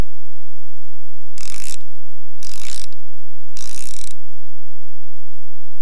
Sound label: Wind Up Music Box